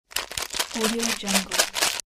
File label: shaking pills